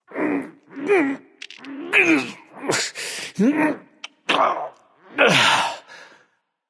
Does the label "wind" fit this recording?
No